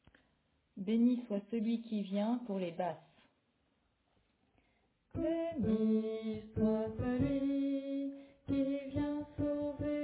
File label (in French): Basse